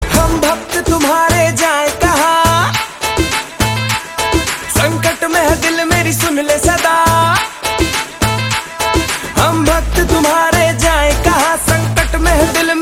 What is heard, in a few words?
Ringtone; Judwaa 2